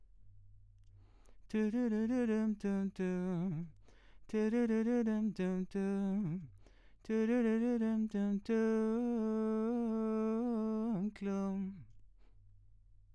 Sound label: VOX